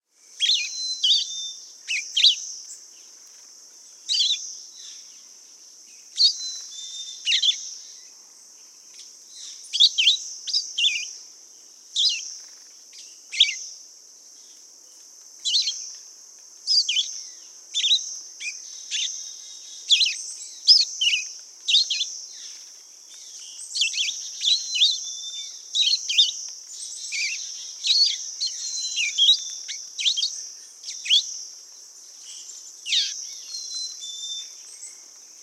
Red-eyed Vireo

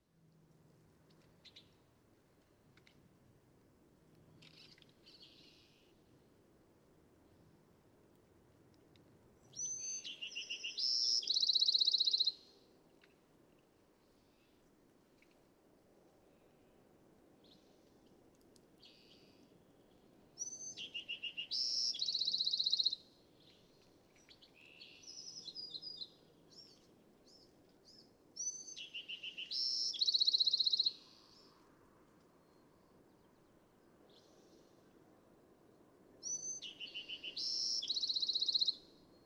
California Thrasher

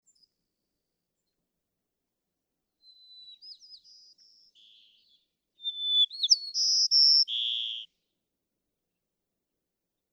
White-crowned Sparrow

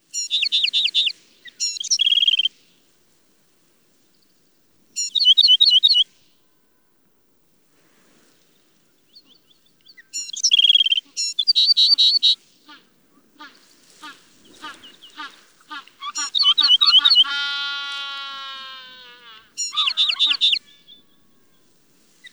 Sedge Wren

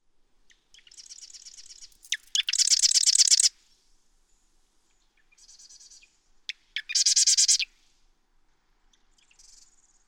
Marsh Wren, birdsong

